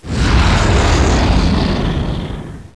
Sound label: Tamtam go; Revista CD Expert 36; Bust